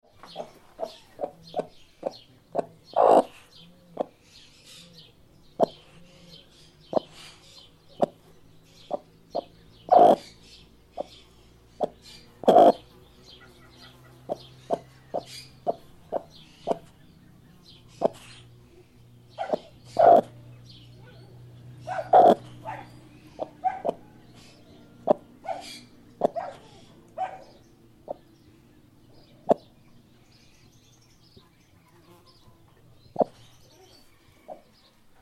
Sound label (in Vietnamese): tieng ga me goi con tuc tuc tuc